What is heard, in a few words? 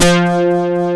AN SYN, SYN & LEAD; SAMPLE WAVE MIXING 2